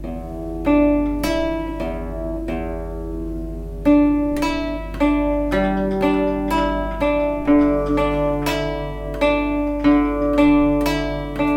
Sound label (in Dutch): gitaar, harmony; Harmony gitaar eigen lied